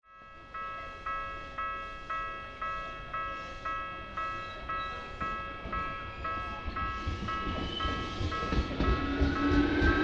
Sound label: train